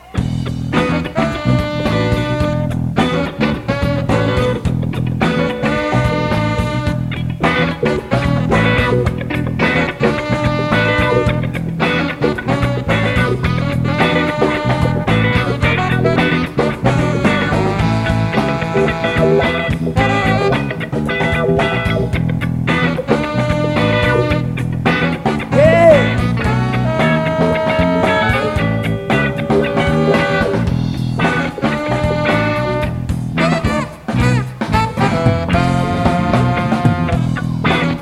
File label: Funk